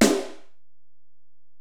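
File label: Acoustic Snare, Alesis DM-PRO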